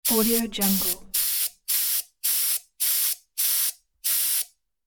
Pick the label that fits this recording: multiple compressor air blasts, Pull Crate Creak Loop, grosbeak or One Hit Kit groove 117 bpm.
multiple compressor air blasts